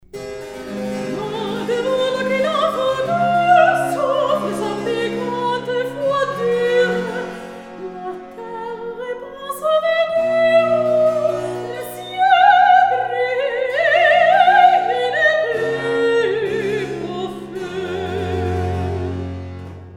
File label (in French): Recitatif; Philomele